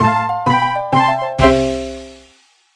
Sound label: Completed Schematics